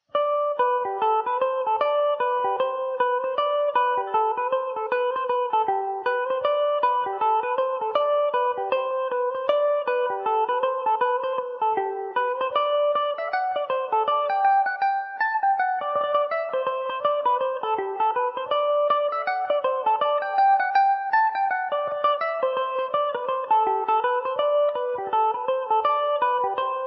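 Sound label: G major revelation, irish mandolin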